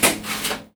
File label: Foley